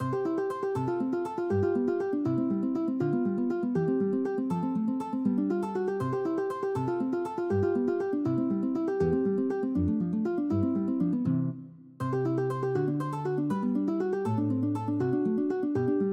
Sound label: Estudio en Arpegio, chitarra fingerstyle; Agustin Barrios Mangore